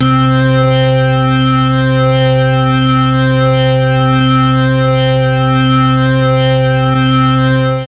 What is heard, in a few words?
C2 organ, mellotron MKII organ